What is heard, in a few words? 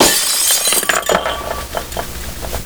Glass Shatter Light, impacts; Glass Shatter Light 04